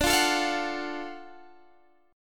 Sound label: Gb Minor Major 7th Sharp 5th, guitar